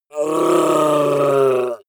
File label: orc call painful long; kreaturen